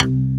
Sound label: ZOING, Synth Bass, Roland